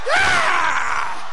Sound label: scream